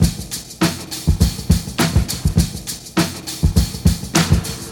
102 bpm drum loop c key, Free drum beat - kick tuned to the C note. Loudest frequency: 1779Hz